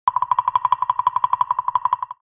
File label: Cartoon tiny running; cartoon running and walking